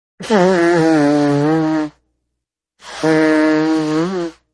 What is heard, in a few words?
fart sound